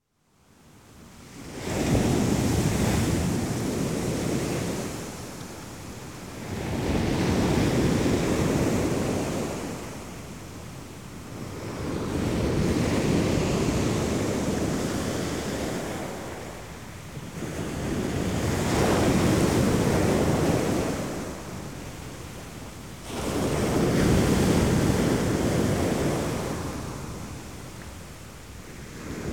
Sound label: Waves Washing On a Beach; Dr. Sound Effects; 210 Nature Sounds - 20 Hours of Relaxing Natural Ambiences for Meditation and Sleep